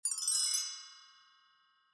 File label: tone